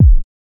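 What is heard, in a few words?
Bass drum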